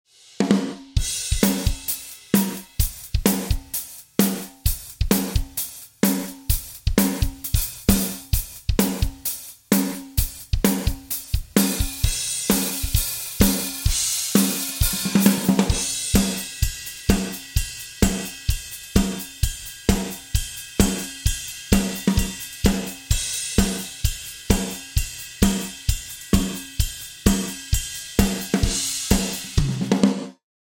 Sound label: Drums Yamaha SPX990 Big Snare; Audio Ease Altiverb XL plug-in is a convolution reverb